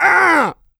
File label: pain large, player; DoomRTX; big